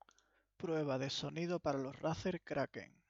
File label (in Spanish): Razer Kraken prueba sonido